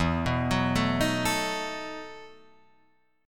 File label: E Major 7th Suspended 2nd Suspended 4th, Guitar, Drop D